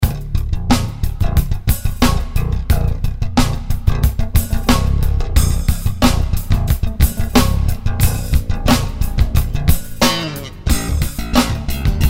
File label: Bass